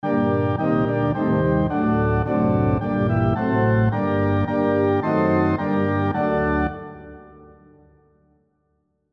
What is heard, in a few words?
SATB on Piano Example